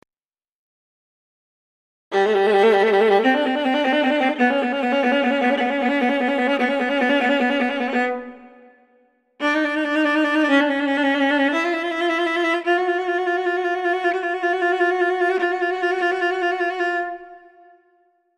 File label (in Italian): VLA solo trylky